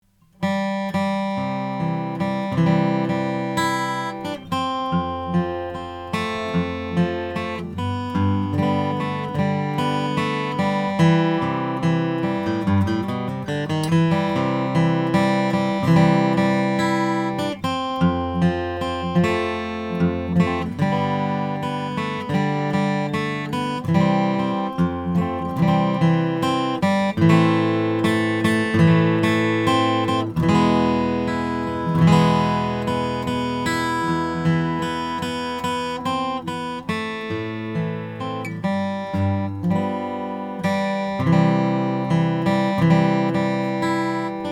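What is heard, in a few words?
langejans r 6 brazilian rosewood, guitars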